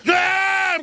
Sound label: scream; Alex Jones